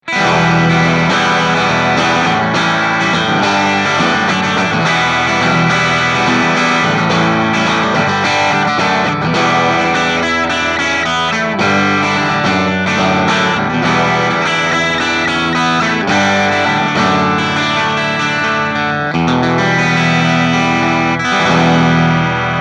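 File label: Crunch Bridge Single, guitar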